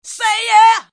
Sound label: diva say yeah, Cheesy Divas, vocals